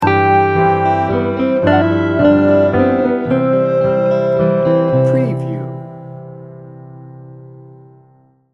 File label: oh little town of bethlehem sting bumper